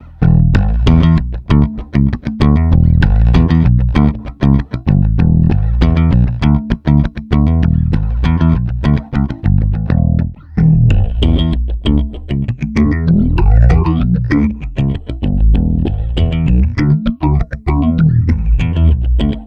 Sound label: Phaser; Softube